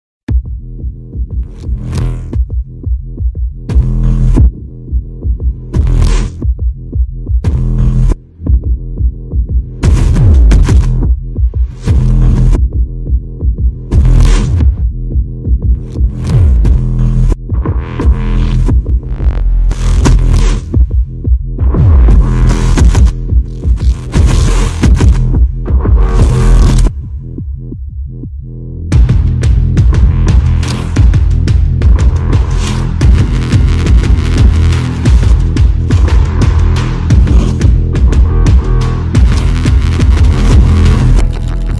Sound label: dun dun dun